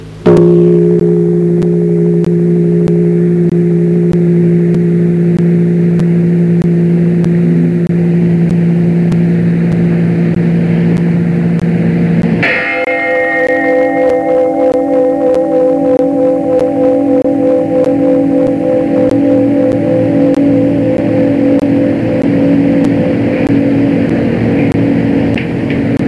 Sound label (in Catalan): campana